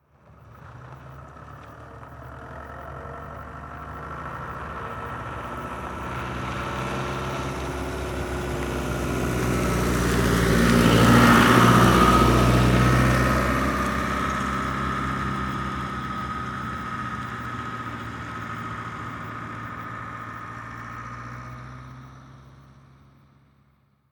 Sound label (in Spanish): furgoneta